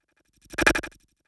Strobe Transition

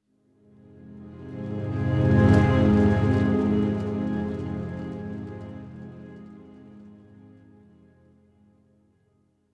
Ambient Transition

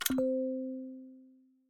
Notification

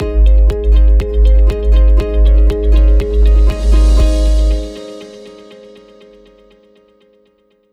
Corporate Transition

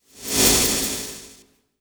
Aggressive Transition

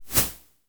Slider

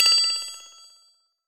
Notification

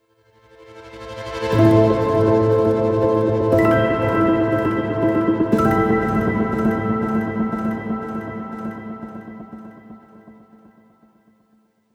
Corporate Transition

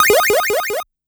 Game Sound

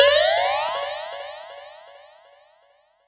Game Sound

